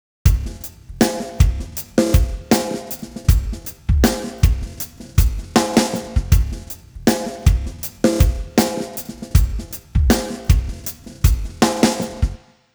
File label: drums 133; digidesign pro tools ts; timestretch